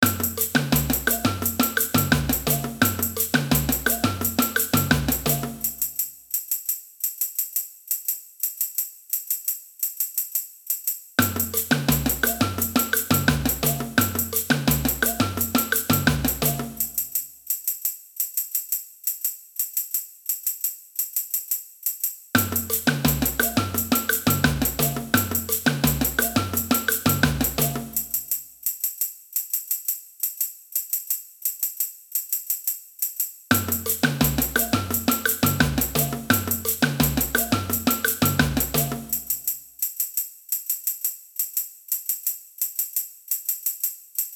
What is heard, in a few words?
pan, samba; samba band